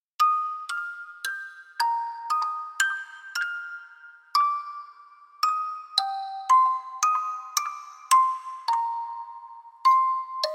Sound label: Music Box; China